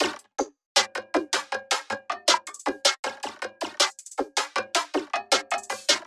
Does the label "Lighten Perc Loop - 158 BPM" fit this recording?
Yes